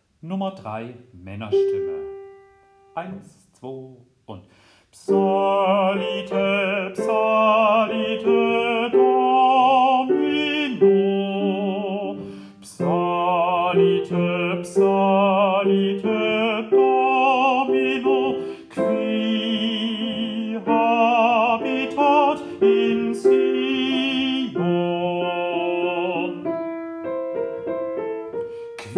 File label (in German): Männerstimme; Dioezesan Caecilien Verband; DCV Chorfahrt; Übaufnahmen